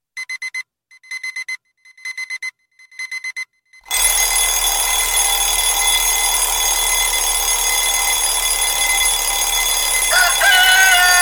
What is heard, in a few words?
Alarm Chicken; Alarm Chicken 2010